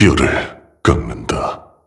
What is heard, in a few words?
kill; blade lineage